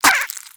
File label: Vocal Tranq Grunt